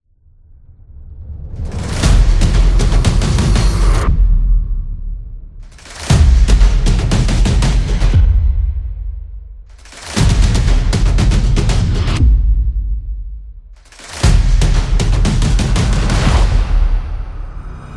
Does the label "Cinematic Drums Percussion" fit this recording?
Yes